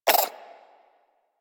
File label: Vocal Tranquilized Grunt; Warframe; Hunting Critters; Cryptilex